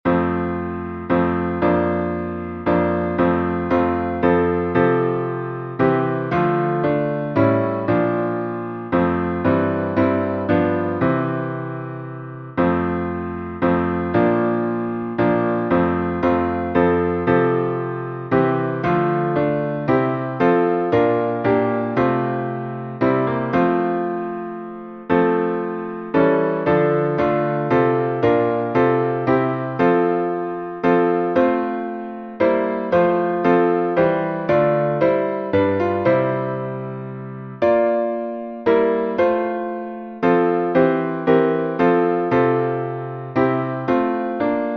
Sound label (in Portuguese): salmo instrumental